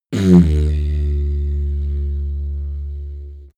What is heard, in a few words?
didgeridoo short heavy